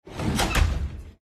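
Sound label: door close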